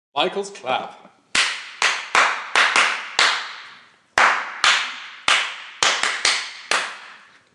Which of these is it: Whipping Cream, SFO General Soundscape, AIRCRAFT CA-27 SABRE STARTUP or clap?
clap